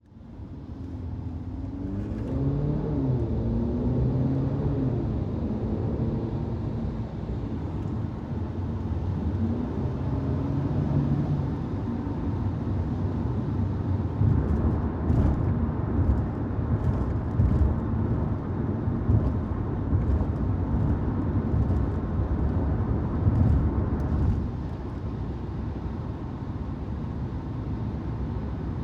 AMBISONIC A INT Mercedes CLA Drive Fast Highway Steady, Vehicles